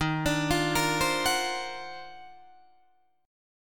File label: D sharp Minor Major 13th, Guitar, DADGAD; arpeggiated; 13,17,15,15,15,16; chord